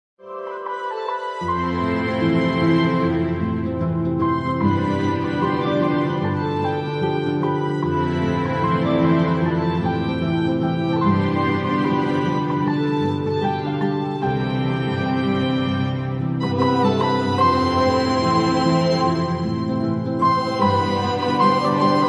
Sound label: instrumental ringtone